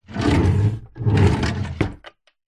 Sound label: household drawer wood pine open close